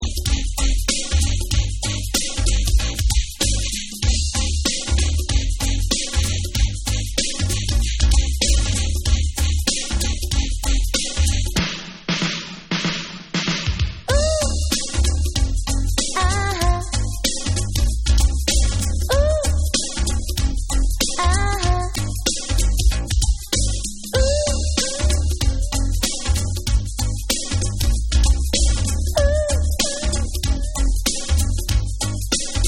Instrumental

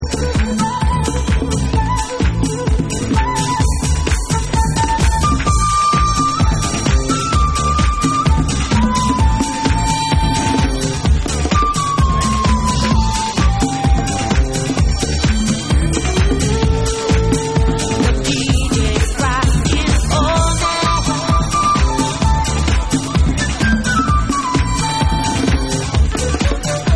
MOODY FLUTES Good Life Vox Mix

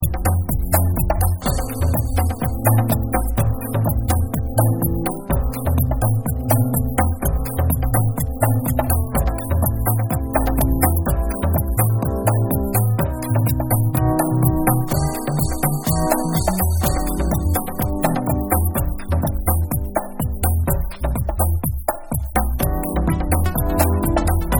Instrumental

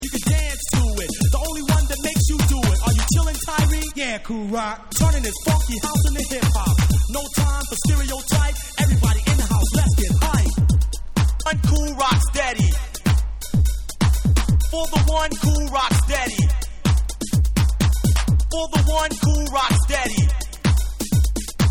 Hip House

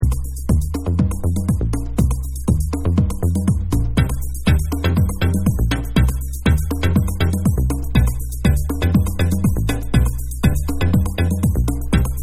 Sax Mix

